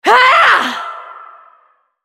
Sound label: ultimate effort; Lissandra